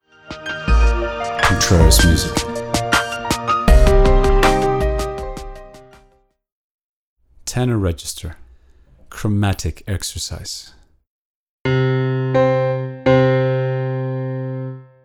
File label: tenor chromatic exercise